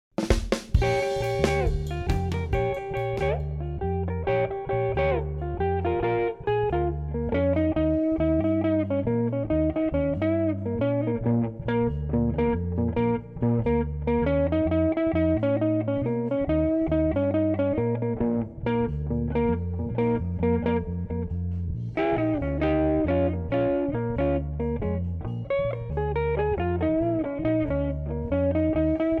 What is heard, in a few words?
Rhumba 2 Variation play along song